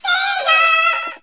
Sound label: SE GAME BREAK; maimai